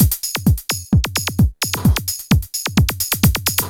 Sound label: PHATT BEA